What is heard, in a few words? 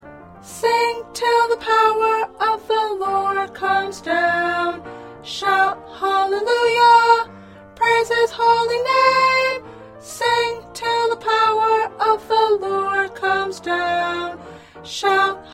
1st Soprano